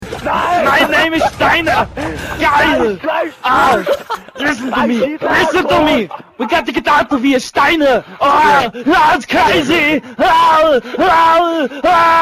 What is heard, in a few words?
scream